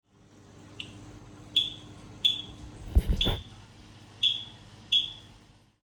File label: Philautus Vittiger Calling Sound